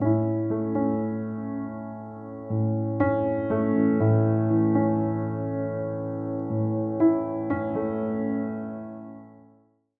piano loop 2 4; piano pack

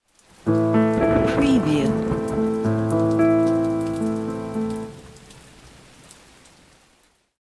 octave 3 arp piano; آرپژ 4-4 پیانو

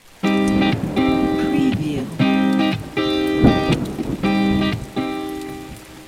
Spark effect, Electric Guitar

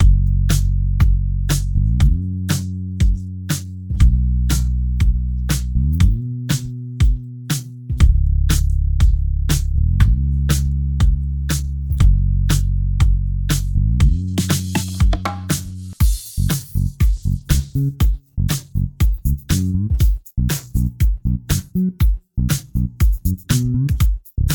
guitar bass 2-4; لوپ-مارکتی

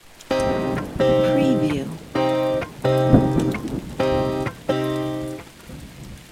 Soft no effect, electric guitar 2/4

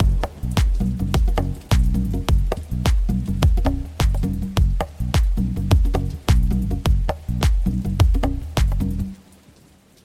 conga 6/8; bastaki; percussion loop

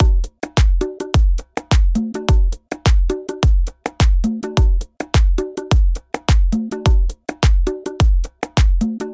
bastaki, 105bpm

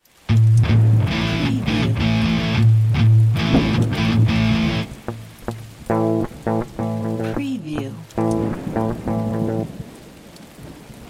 Power Bastaki A5 Distortion 105BPM, گیتار الکتریک; پاور کورد بستکی 2 گیتار الکتریک; هنر صدا

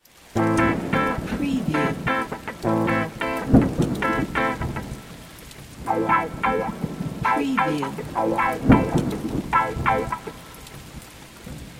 RTM Bastaki Am 105BPM, Guitar, electric; ریتم بستکی 6 گیتار الکتریک

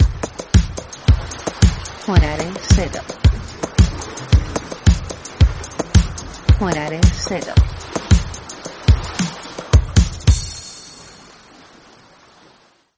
persian groove

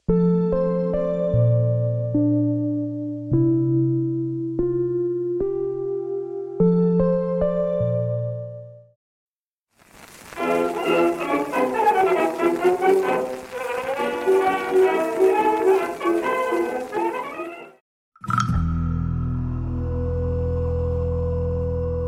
instrumen music; instrumental

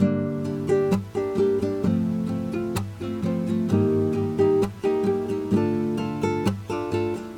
4 4 guitar

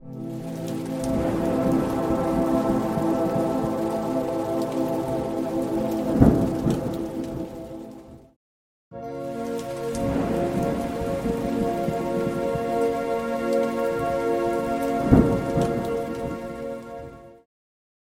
ambient pad; ambient-series

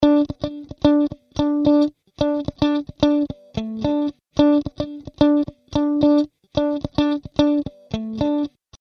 palm-mute

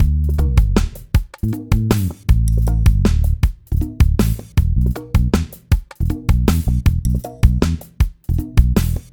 Guitar Bass 6 8 Persian; bass-pack

